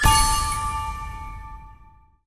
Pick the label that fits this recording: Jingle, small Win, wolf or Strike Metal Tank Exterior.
small Win